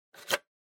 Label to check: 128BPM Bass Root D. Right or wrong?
Wrong